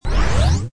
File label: door open